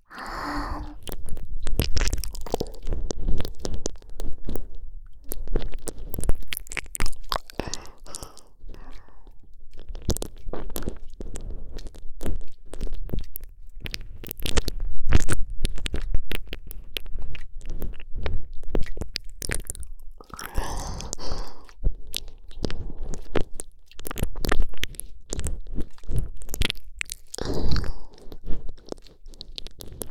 超・超 ぐっぽり 耳舐め!!; ツンデレJKとはじめてえっち！

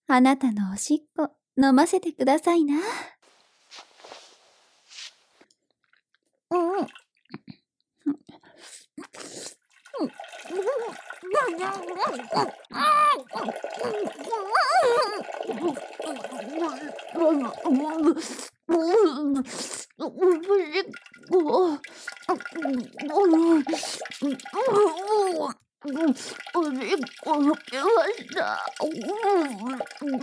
おしっこ シーン より 抜き, 効果音 入り

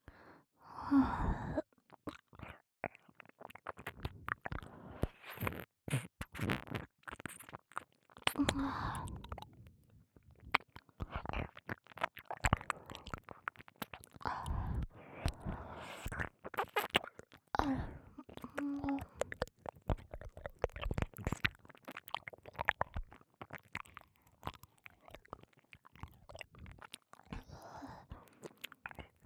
左耳舐め 通常, ASMR

